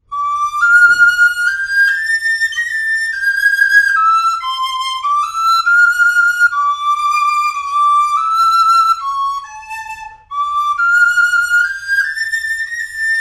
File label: green sleeves sopranino, recorder; Tategoto